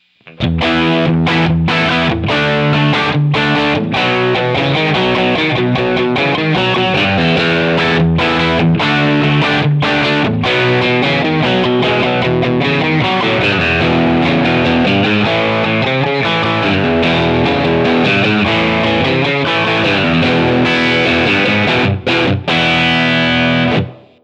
THR10 Lead

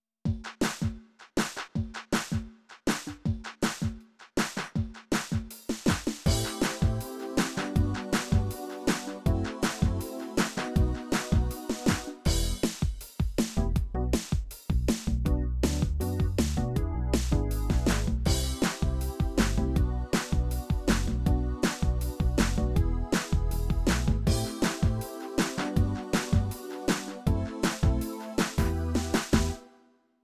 Happy 8 Beat

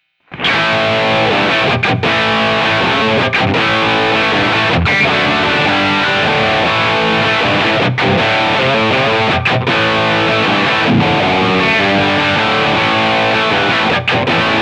THR10 Brit Hi

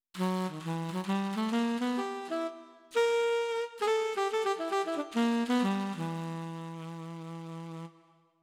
Tenor Sax

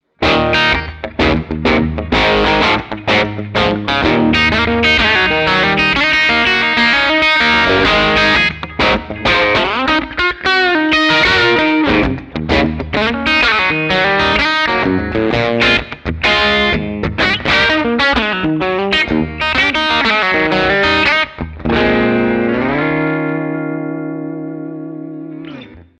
THR10C Brit Blues

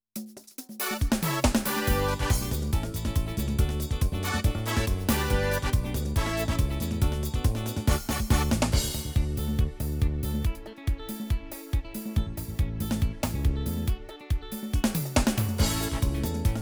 African Gospel

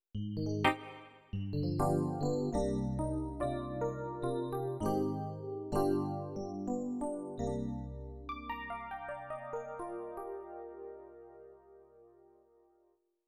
Galaxy Electric Piano

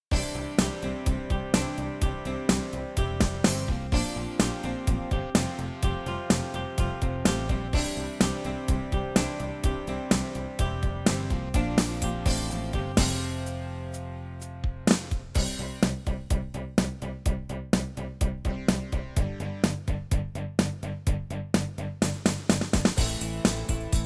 Contemporary Rock

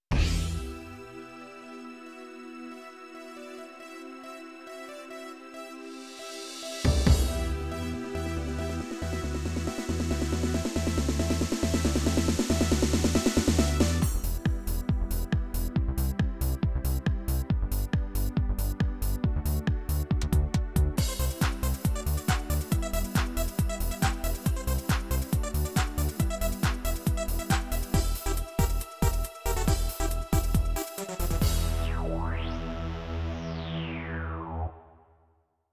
Euro Trance

